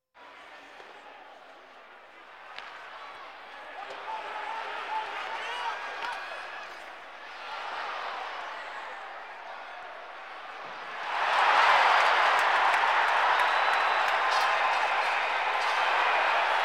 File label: Sports crowds